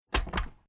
Transport Agriculture Quad Bike Motor Bike Gear Shifter Shift Up; Slowly; Atv